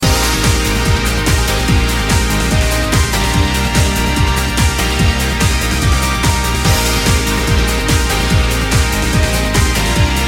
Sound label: BGM GALLERY; Raiden IV x MIKADO remix